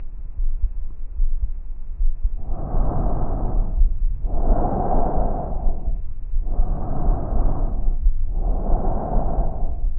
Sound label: tracheal